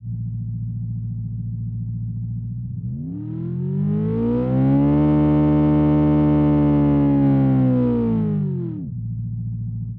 v8 german sport, engine sound

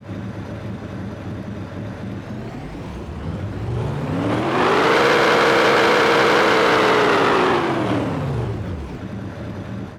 pickup, engine sound

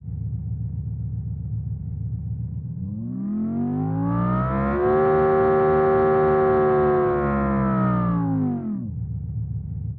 v10 italian ext, engine sound

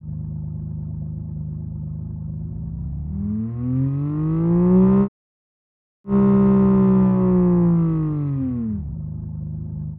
i6 german sport, engine sound

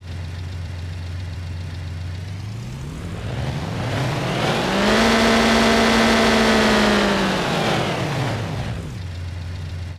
city, engine sound

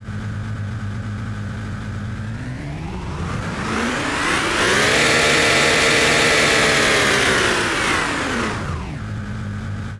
offroad, engine sound

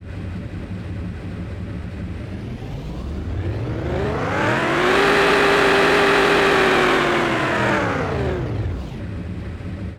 city, engine sound

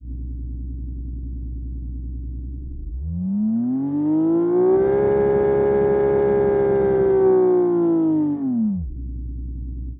v6 japanese ext, engine sound

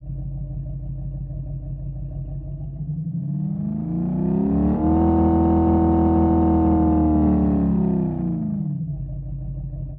v8 american classic, engine sound

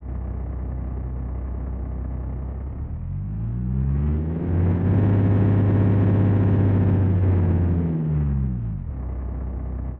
truck classic, engine sound

